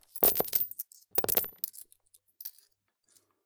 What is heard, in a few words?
poker bet; games; CosmicRage; CosmicRageSounds